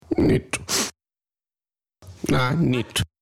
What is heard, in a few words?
bomb